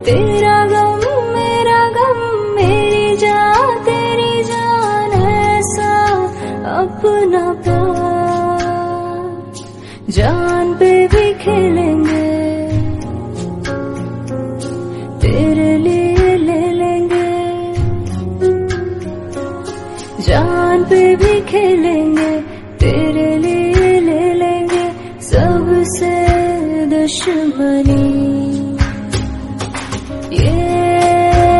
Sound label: female